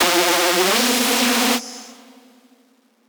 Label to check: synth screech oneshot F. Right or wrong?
Right